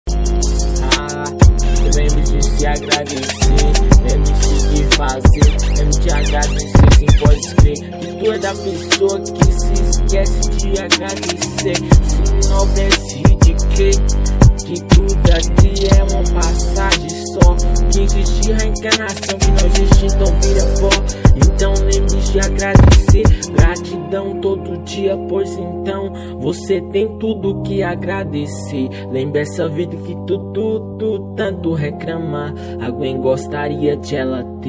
trap

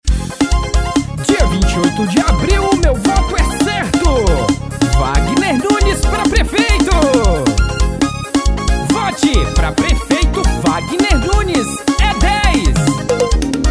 jingles politicos

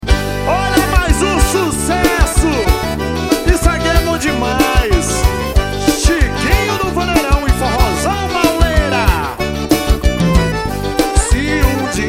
forro ao vivo xote

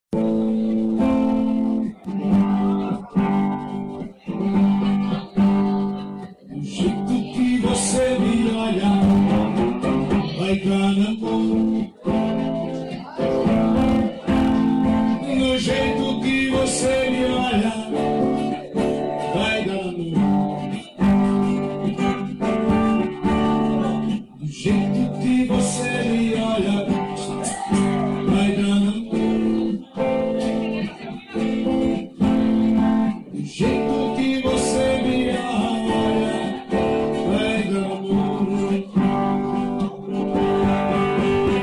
voz e violao

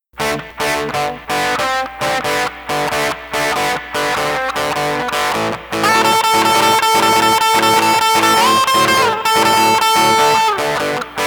domingo somente duas guitarras

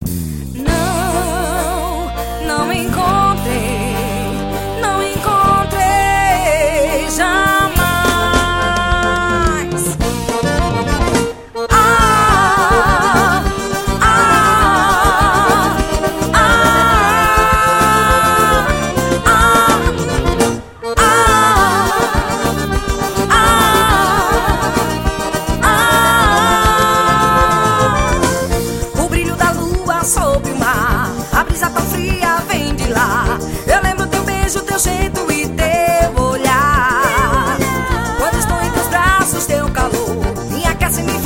forro relax

